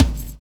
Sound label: fill